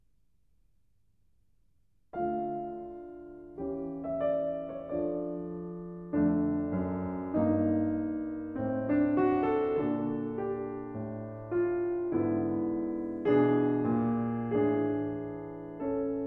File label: Small Piano Pieces, Andante moderato; Grieg; The Complete Music For Piano